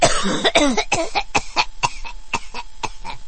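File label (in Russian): кашель женский старческий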